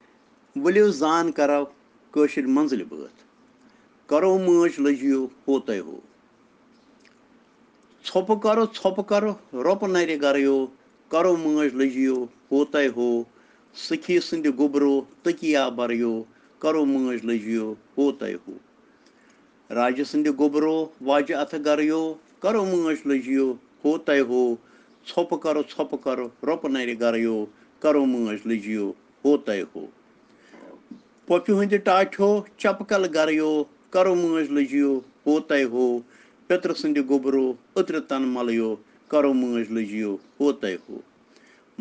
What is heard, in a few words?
Children Rhyms in Kashmiri